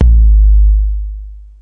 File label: 808 LONG